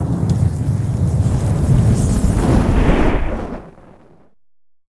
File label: landing flame; smite; Ares